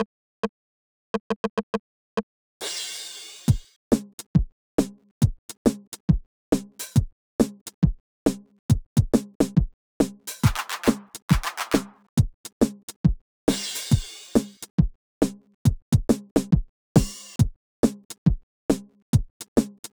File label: drums